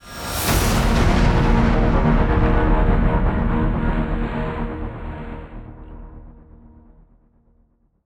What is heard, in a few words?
Stinger Modulate; extinction deluxe edition; ps4 windows xbox one